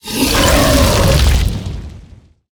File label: Attack